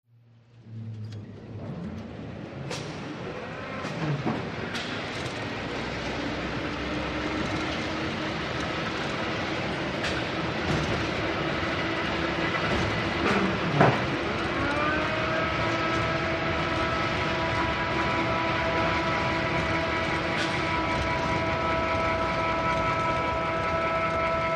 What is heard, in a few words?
Crane Motor Movements, Lifting Object, Cu Perspective, W Clanks And Machine Whir.